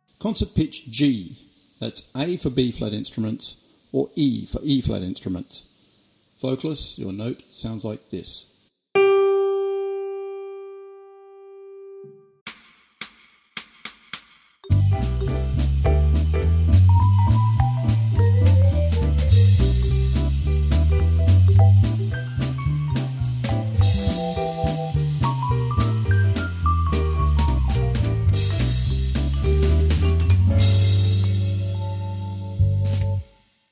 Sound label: Backing